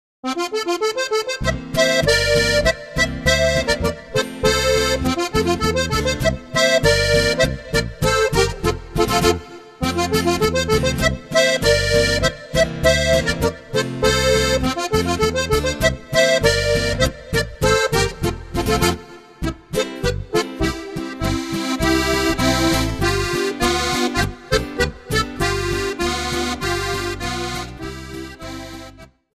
boarischer

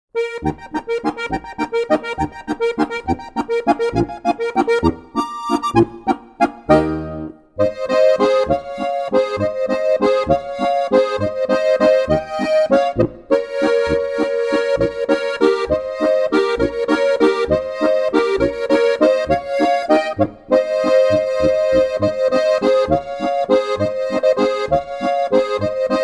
landler; zillertal landler; Musikantentreff im Zillertal

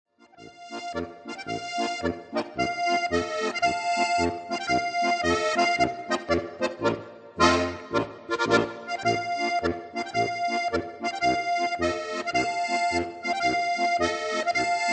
polka

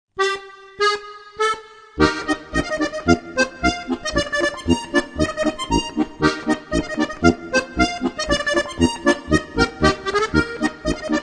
polka

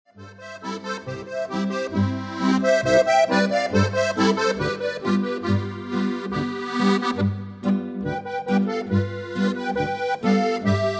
boarischer

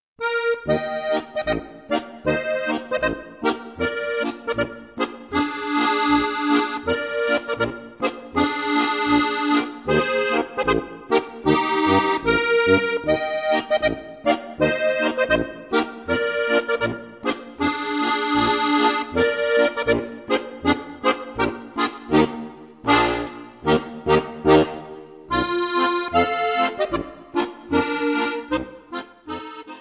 polka

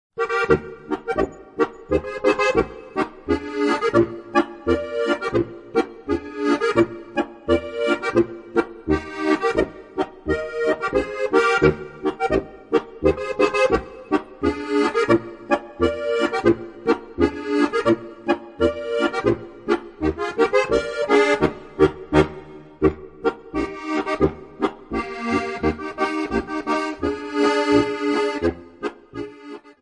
boarischer; 30 Alpenländische Volkslieder und Weisen 2

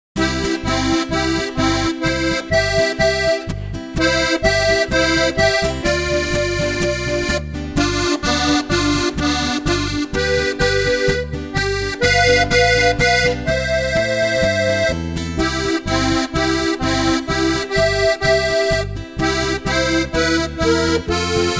marsch

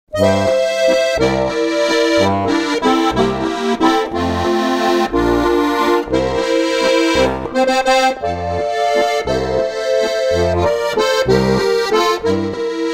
harmonika

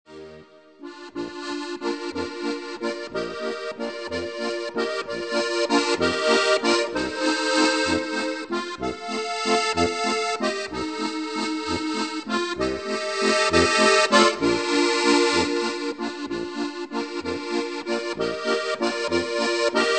walzer

